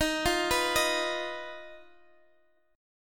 D sharp Suspended 2nd Sharp 5th D sharp, Guitar Drop D; chordshape